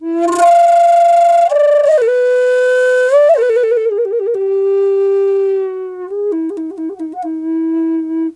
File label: E wild flute; Big Fish Audio - Didgeridoo and Other Primitive Instruments